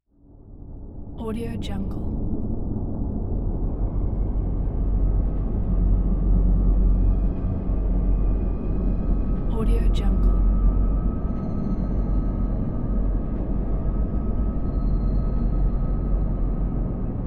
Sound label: space ambience